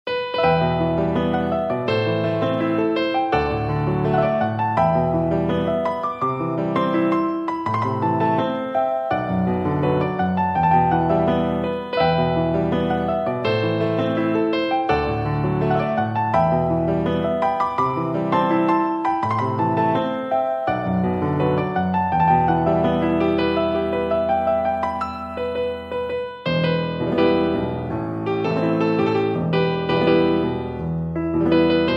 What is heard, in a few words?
Piano